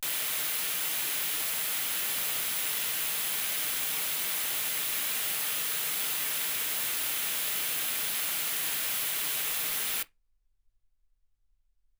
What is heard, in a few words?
wgn